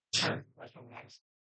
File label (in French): bruit court